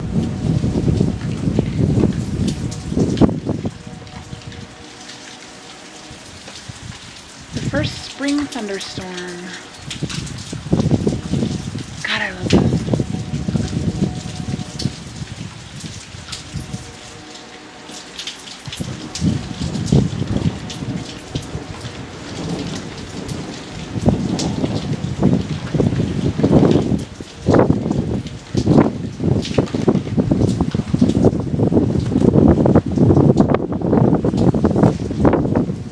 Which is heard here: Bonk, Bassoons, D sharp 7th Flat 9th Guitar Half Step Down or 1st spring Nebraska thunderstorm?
1st spring Nebraska thunderstorm